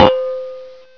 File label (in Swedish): Stekpanna; Dubbelmoral!